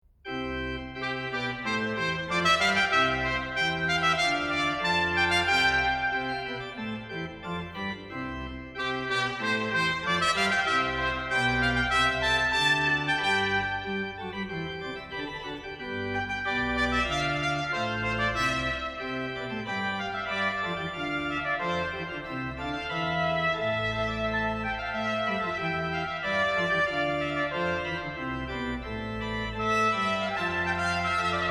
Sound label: Sonata Prima per tromba sola - Allegro, Trumpet And Organ; Op4; GB Viviani